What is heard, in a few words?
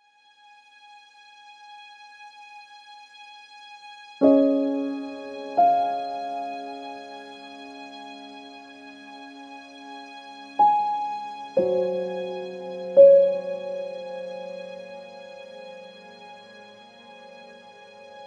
Thoughtful Piano